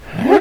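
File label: teleport; I Am Weapon Revival; Jocker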